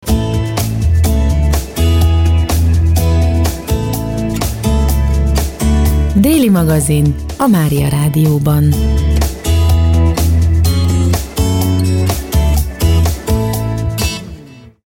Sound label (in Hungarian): Rovid szignal; DELI MAGAZIN; STUDIO GYULAFEHERVAR